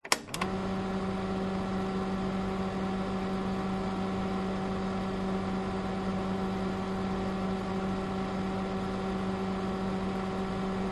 office slide projector vintage on run off no slides voigtlander perkeo automatic; 1960; zapsplat